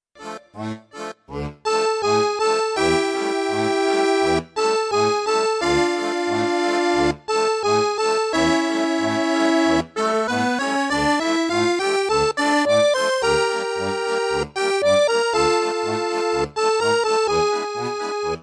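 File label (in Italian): Fisarmonica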